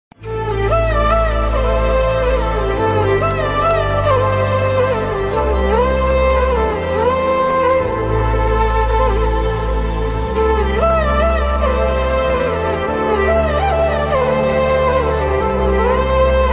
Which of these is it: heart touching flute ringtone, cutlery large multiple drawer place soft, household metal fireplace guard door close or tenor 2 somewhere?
heart touching flute ringtone